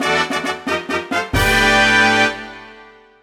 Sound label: All Stamps Collected Fanfare; Picross 3D 2